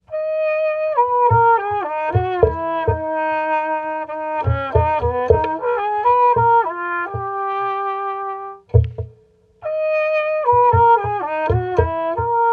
Trumpet One Mic; Manufacturer Audio

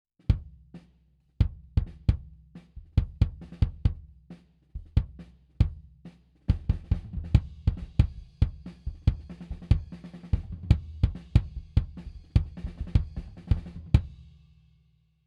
Kick, Distance Tunnel D112 In Hole

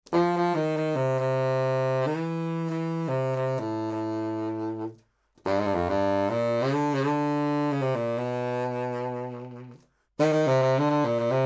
Sax Baritone 50cm C High

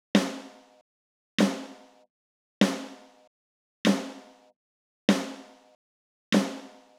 Snare Phase Transient Smearing

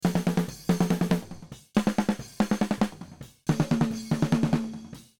Snare Instruments; Recording Mistakes

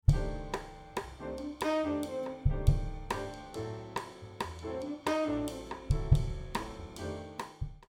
Kick Meld Inv

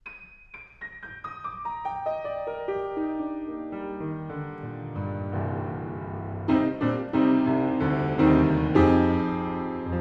Piano, Omni 100cm

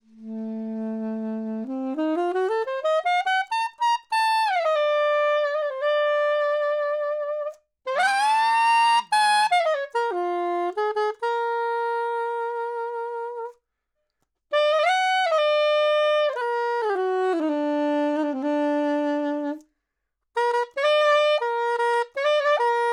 Sax Soprano 20cm Mid

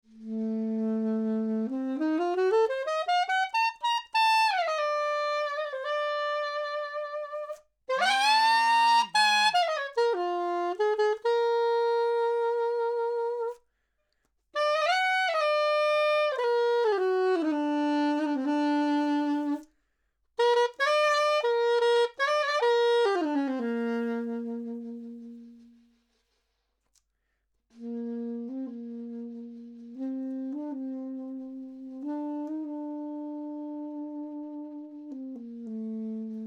Sax Soprano 20cm High Mid

